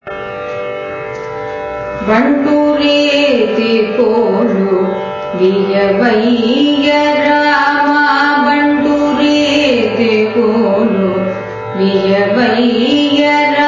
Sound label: bantureethi kolu hamsanadam sangathi, carnatic music